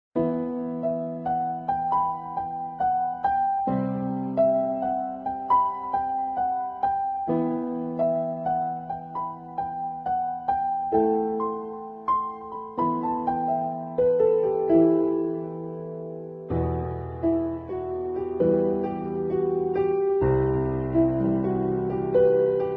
Piano; hindi instrumental ringtone

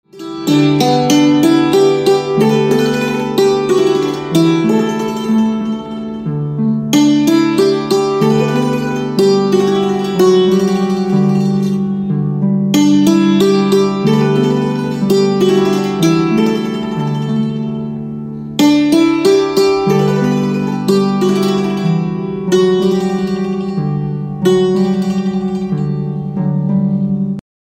Santoor Instrumental Ringtone

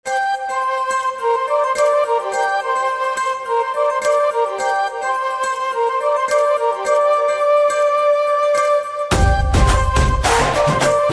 Theme Flute

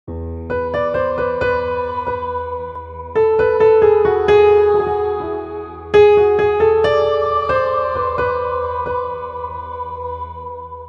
Piano Instrumental Ringtone

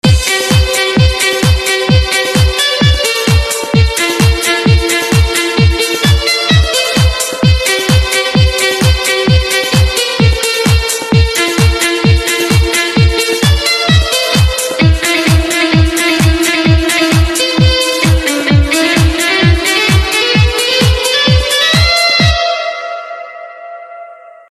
Instrumental Ringtone; piano

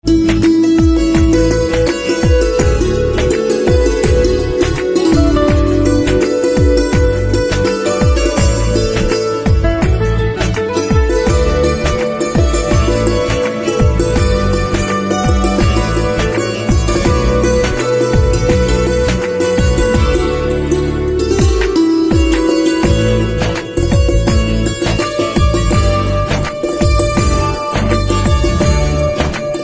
Santoor Instrumental Ringtone

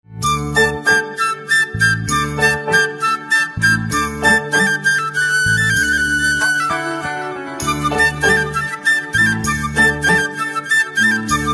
Flute

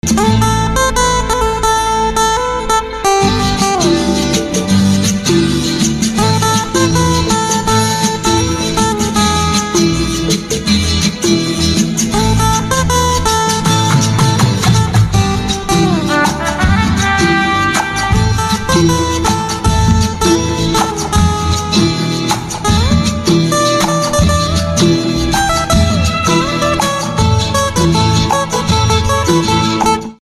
Guitar Instrumental Ringtone

